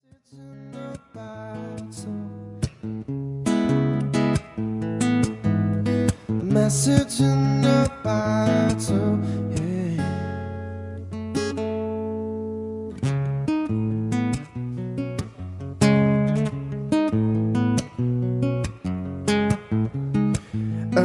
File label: Acoustic